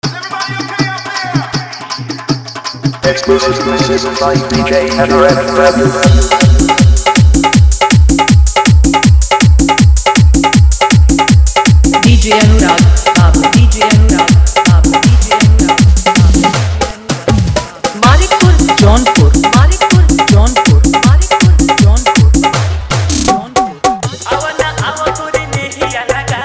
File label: Old is Gold Fully Dhollki Bass Rod Dance Remix